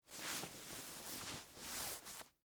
Track Pants Adjust, Foley, Clothes, Track, Pants, Adjust, Movement; Dressing Sound Effects; BlastWave